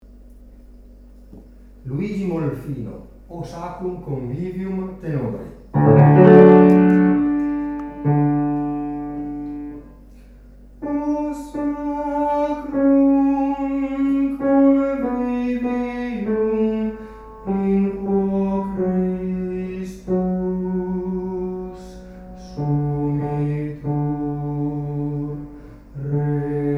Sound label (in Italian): Tenori; O Sacrum Convivium